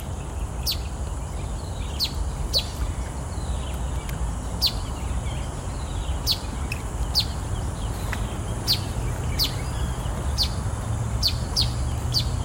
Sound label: Baya weaver